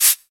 shaker hi hat d sharp key 28; percussion

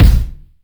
small reverb kick sound a key

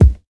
nice hip hop bass drum c key

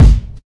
tight low end kick single shot g sharp key; kick drum samples

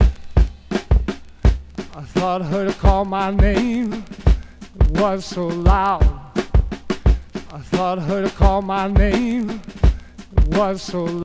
92 bpm modern drum loop sample a sharp key

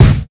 sharp kick one shot c sharp key

